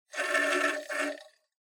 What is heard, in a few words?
Portable Stereo Driving Noise CD Seek